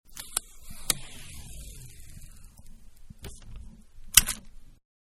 Tape Long Dispensing; Offices; Manhattan Production Music

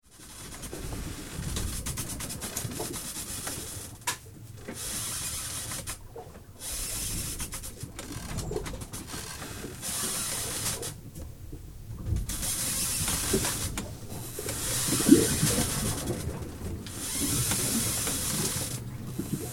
Galleon Tall Ship Stationary Atmosphere; Wood and rope creaks and background water lapping; Boat

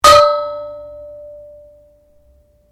GONG 4 INCH F; Instruments, Musical, Percussion; Foundation3

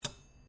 cutlery general place cloth, Household cutlery

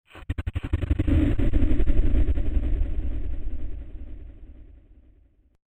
Percussive Blast Explosion; Bomb Sound Effects; Andrew Fleming

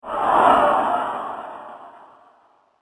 Descent Magic Whoosh; Whoosh Sound Effects; fantasy

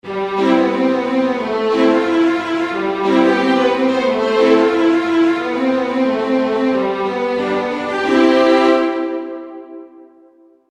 Orchestral Tune Background Loop, string loop

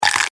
Cartoon Bite Chomp; Cartoon Voices; Cartoons

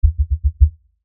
Lfe Pulse Low Bass; Drone; Sound Design

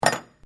China cup dropped table; china cup breaking; Drops; Objects

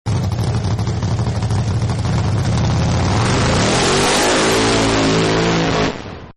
Hot Rod Pass, Cars; Vehicles; Partners In Rhyme

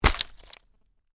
Ice impact lite, Ice: light impact with debris; Snow; Weather; Stuart Duffield

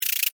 Insect Voice Chirp, Vocalization, Bug; Animal

